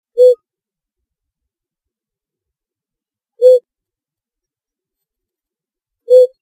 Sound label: flamm, owl